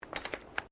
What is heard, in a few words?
FOOTSTEPS THONGS (FLIPFLOPS) WOODEN DECK STEP; Footstep Foley; Sound Effects:Human